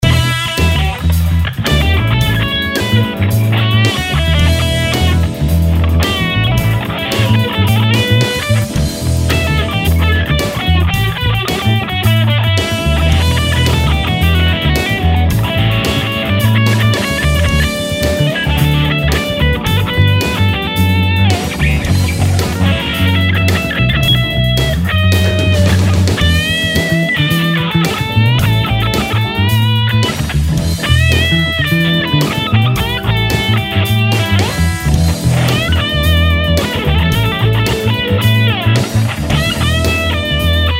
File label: Groover In E 110 Solo Guitar; Blues Solos